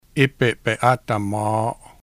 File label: noise laugh